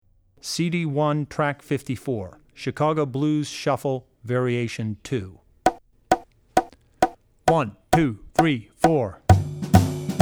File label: Chicago Shuffle (Variation 2); Drummer's Bible Tracks